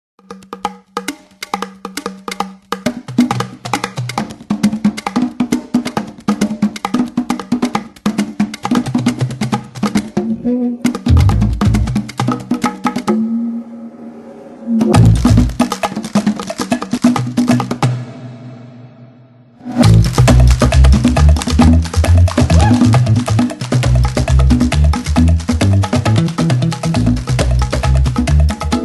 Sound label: nokia ring tone